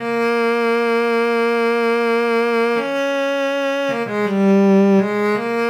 Cello, Trip-Hop Loops; Sonic Foundry (Sony Creative Software); Rondo Brothers Trip-Hop Loops & Samples; Hard Rocks The Roof

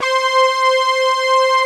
Obie Stack, OBlip; Optical Media International; Sonic Images Library

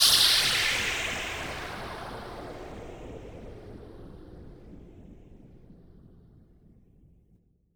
Break FX, Club Techno, 137 BPM; Zero-G; Construction Kits

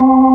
B3, Drawbars

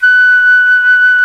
jazz flute

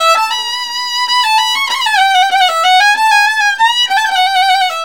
VIOLIN, Balkanish Traditions; USB Soundscan vol.63; AKAI

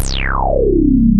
HIP, HIPHOP; Rubber Chicken Software Sample Bank

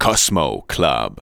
vox; Ueberschall; Techno Trance Essentials

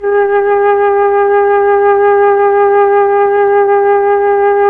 FLT, Melotron Flt, Tron Flutes; Roland LCDP09 Keys of the 60s and 70s 1

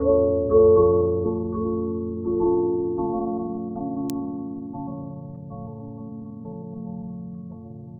Chyme; Sonic Foundry (Sony Creative Software) - Future Retro Dance Excursions

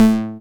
TECHNO; X-Static Goldmine 1

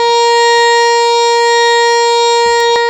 HARMONIUM, World Instruments

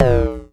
FREQ MOD, Dual Percussion Synthesiser; Klone

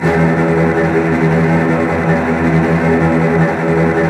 STR TREMOLO, Strings

